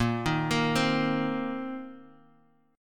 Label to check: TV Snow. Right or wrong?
Wrong